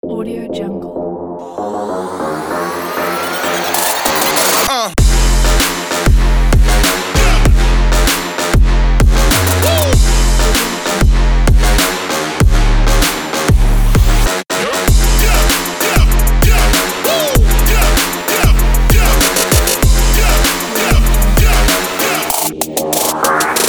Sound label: trap